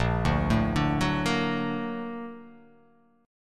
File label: Bb Augmented Major 7th Bb; Bb+M7 arpeggiated; JGuitar